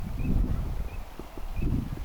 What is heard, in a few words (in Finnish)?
mika pieni aani voisi olla pikkuhuitti